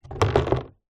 household plastic bucket empty knock over on wood floor